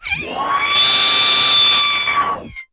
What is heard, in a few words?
SCREAM C5, Yamaha VP-1, SCREAMSYNTH; Music Store; SCREAMSYNTH1